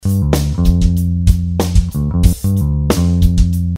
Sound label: Bass Drum Loop